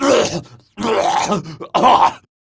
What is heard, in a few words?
Coughing; Stoic; Warframe; Evacuation